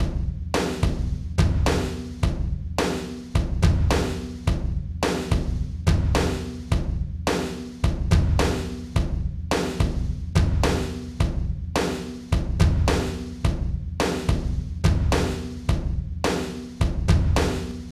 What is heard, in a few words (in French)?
batterie